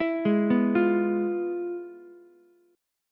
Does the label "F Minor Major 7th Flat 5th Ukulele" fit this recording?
Yes